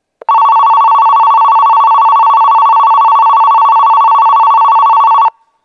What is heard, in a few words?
kozurushinden 1 bannsenn